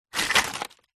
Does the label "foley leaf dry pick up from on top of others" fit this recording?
Yes